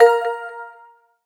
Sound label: Countdown Tick; American Fugitive